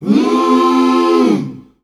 Mmm, sung phrases; Voices Of Africa

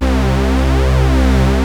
JUNO 60 C3; Hollywood Edge - Giorgio Moroder Rare Synthesizer Collection

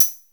RING HAT; DRUMS & PERC

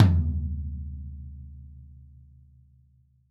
DW Green Maple Tom; Sonic Foundry (Sony Creative Software); Vital Drums

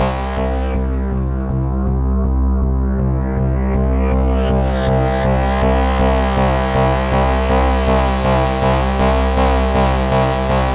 C2, SynthLine; 90 s Sample CDs; NiceBeats; SynthLine VOL-1

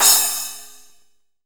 LITTLE SPLAS, HATS + CYMBS; Zero-G; Funky Elements

